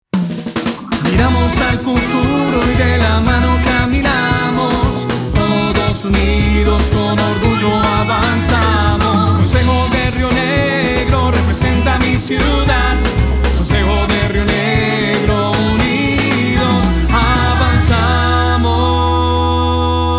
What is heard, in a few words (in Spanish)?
jingle; presidencia concejo rionegro